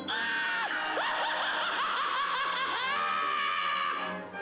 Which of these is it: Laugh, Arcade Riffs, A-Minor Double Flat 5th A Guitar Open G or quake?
Laugh